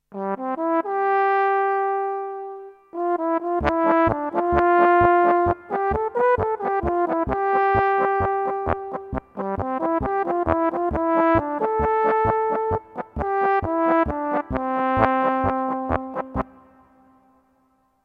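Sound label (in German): Ventilposaune Diskant Zugposaune Akkorde Posaune Bass weich E Bass normal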